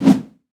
projectile swoosh; Garry's Mod; tf2; passtime

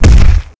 step; khnum

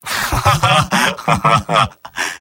scout laugh evil; vo; tf2; mvm

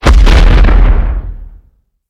step; poppy; catnap dragon

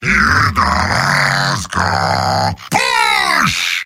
heavy singing; mvm

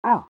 pain sharp; pyro; Garry's Mod; tf2 content

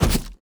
fly walk

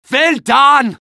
cheers; medic

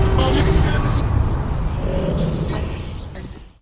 glitch spawn; skibidi toilet glitch

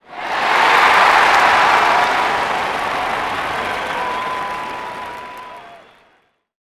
bumper car cheer; Garry's Mod; tf2

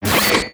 medi shield burn, weapons; tf2

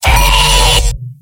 m pain severe; pyro; tf2; garrysmod; mvm

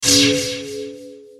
clone appear; skbt famale agent; astro glitch

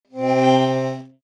step; poppf accordimoth

